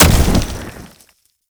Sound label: Hit; Warframe; Uriel; Infernalis